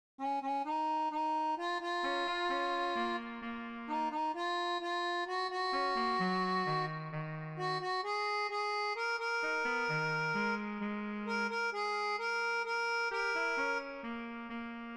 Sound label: MIDI